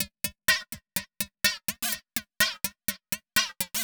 XTRA HOUSE 125

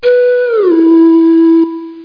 slide

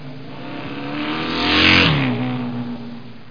Suzuki; MISC VHCL

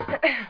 ladder; Daikatana; mikiko

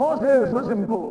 walla; DAoC

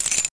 PICK KEY; thief

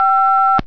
tone; 8bit

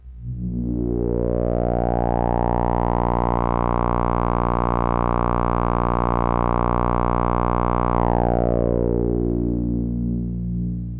korg, Synth

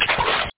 splash

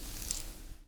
foley cloth short; Earth and Beyond; EA Games

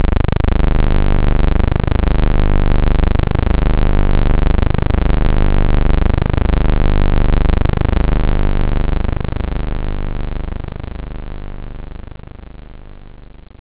terror, synth

